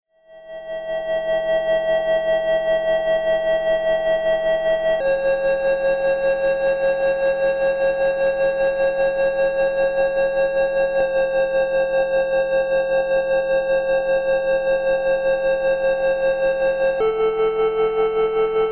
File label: tinnitus rife